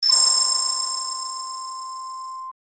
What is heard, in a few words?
magic